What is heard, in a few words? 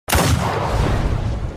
punch